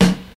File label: modern low tone steel snare drum sample F sharp key